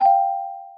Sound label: plinko pos